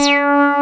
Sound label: SYN Ana; Best Service Gigapack 1